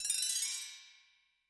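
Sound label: Bell Tree; Yamaha DTXpress-II; Perc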